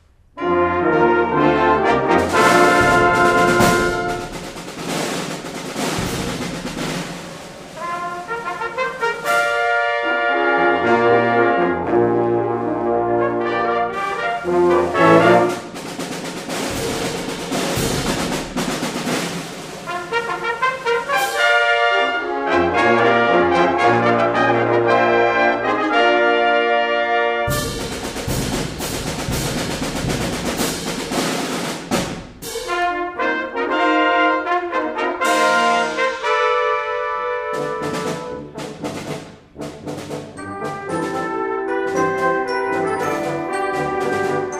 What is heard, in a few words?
Fanfare, Brass Ensemble; FCC